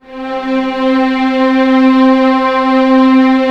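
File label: strings C3 FF L; Sound On Sound - Peter Siedleczek's Orchestra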